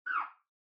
OS Sound (Speaker-Notification)

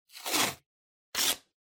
Kraft Tape Pull Rip

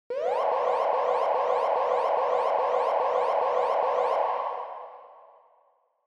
Warning Siren Reverb Low Long

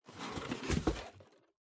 Cardboard Box Open; ダンボール; 開ける; 梱包材

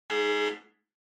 Electromagnetic Buzzer Room

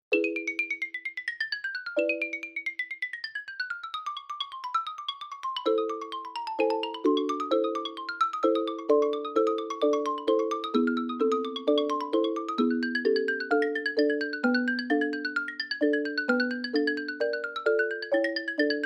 Slow; Flight Of The Bumblebee

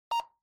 Handheld Transceiver Signal, Rx Beep

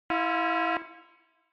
Warning Siren Slow Single

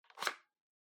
Portable Cassette Open

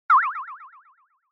Anime Motion Delay

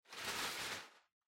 Packing Paper Far Rustle; 梱包紙; 遠い ガサガサ; 梱包材

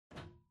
Wall Outlet Far Wall2 Unplug

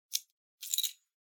Pocket Watch Chain Noise

